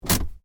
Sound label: Handbrake On, car; Hyundai Accent 2008; Hyundai hire car; Vehicles